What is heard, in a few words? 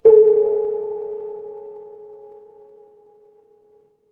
instrument gong